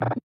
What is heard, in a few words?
App Negative